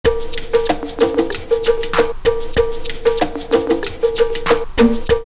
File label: Mounga Conga 95, drumloops OldSchool; X-Static Goldmine